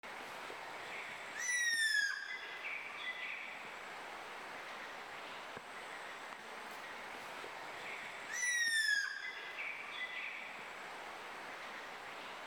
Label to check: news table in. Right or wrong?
Wrong